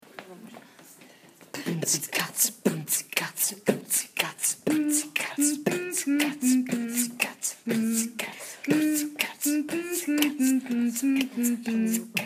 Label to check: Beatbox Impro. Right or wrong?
Right